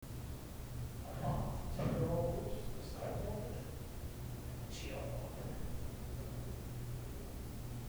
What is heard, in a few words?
Boiler